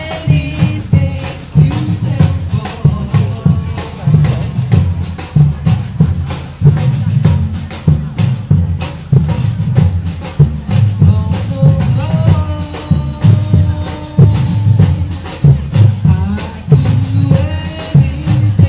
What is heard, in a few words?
samba style